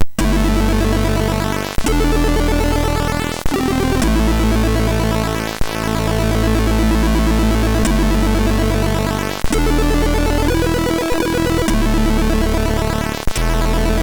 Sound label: Epick Bit, sid MOS6581R3